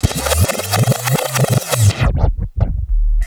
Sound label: Scratch Tape; Ambient Grooves